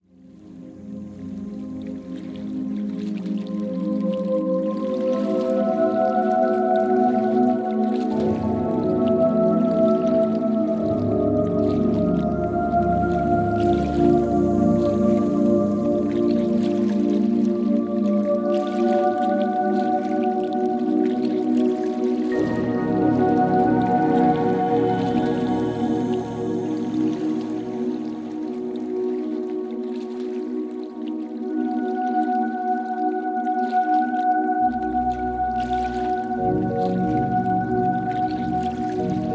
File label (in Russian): zvuki prirody dlya malyshei zvuki prirody dlya sna; spokoinaya muzyka dlya malyshei